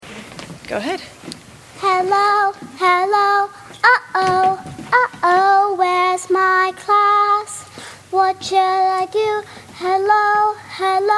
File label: improvised composition; on making operas with kids